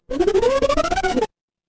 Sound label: retro game power up